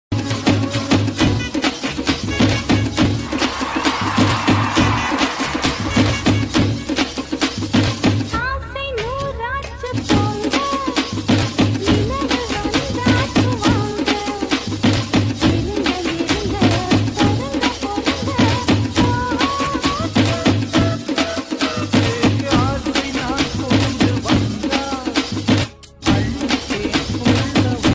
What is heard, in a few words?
song remix